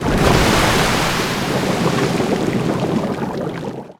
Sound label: Pirate Ship Sink; Zelda